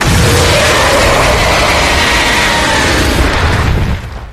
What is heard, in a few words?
roar; valsablos